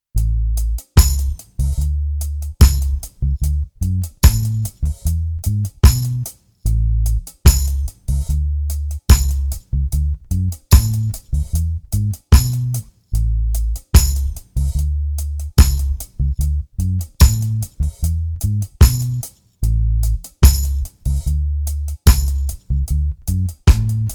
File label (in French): Basse Batterie 74 Bpm